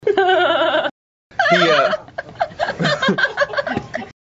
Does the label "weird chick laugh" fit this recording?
Yes